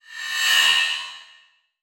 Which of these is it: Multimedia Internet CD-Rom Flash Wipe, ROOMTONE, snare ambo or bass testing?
Multimedia Internet CD-Rom Flash Wipe